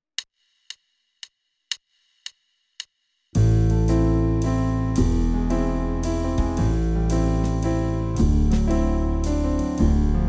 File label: accompaniment only